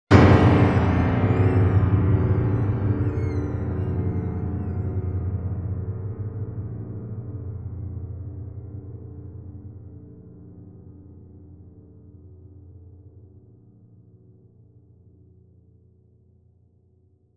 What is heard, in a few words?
piano sting